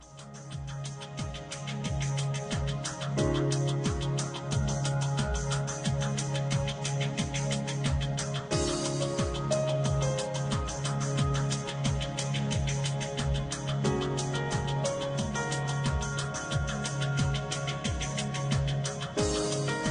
Visionary Corporate

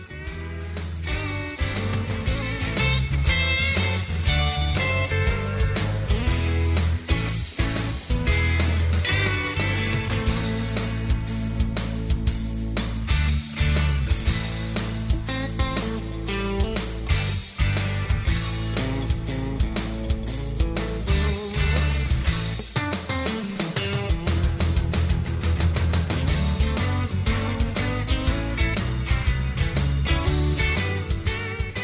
Swing on Down New Country

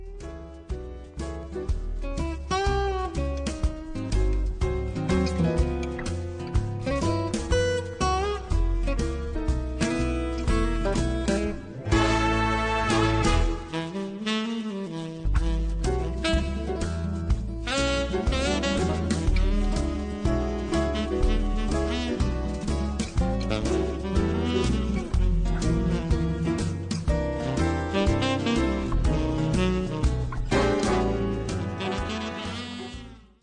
Blues Contemporary

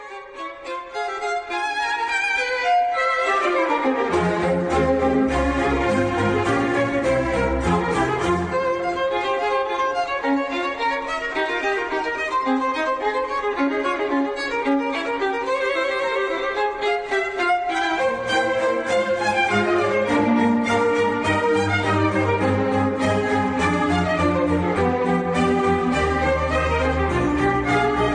Vivaldi Classical

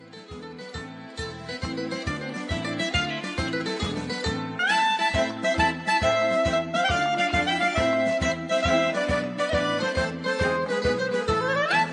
Torantella International

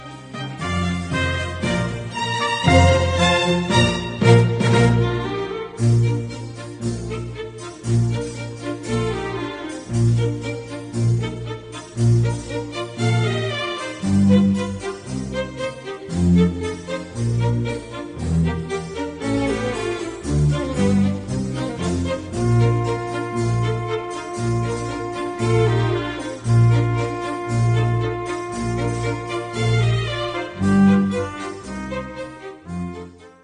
Country Garden Classical

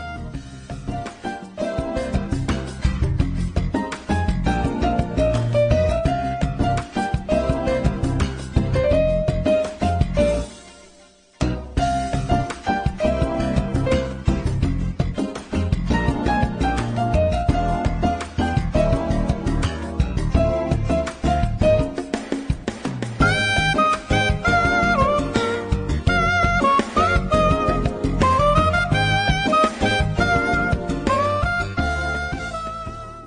Jazz Jam Jazz

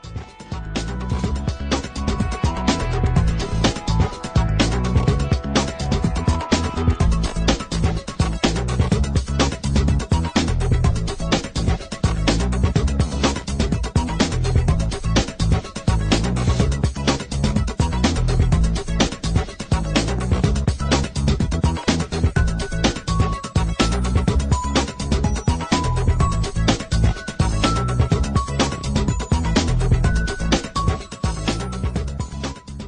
Hi Tech Contemporary

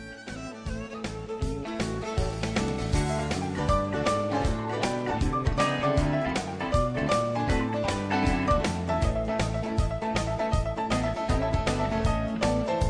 Texas Swing New Country

